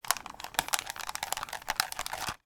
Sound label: technology cord wrapped round computer mouse